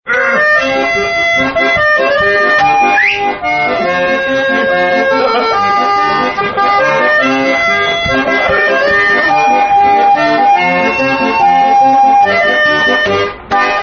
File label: accordion; alternative